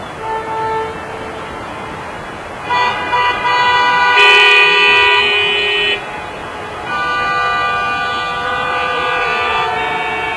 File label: traffic; tfc